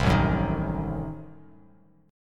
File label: A Diminished 7th A down Bass Standard; Adim7 strummed; JGuitar